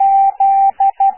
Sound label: Z morse code